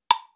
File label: jam block